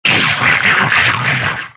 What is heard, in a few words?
gargoyle dead